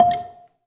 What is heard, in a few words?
Gambang Soft Beater, Gamelan